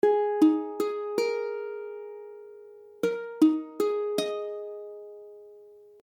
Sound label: Absus2, akordy ukulele